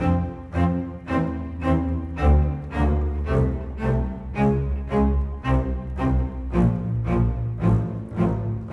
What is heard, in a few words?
Bass, Cello, Viola, Orchestral, Rock Pop